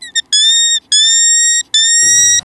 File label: tamarin call